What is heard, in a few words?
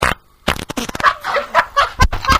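bubbler, Farts & Belches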